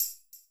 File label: Tamb; Belfast; Old Skool House; 137bpm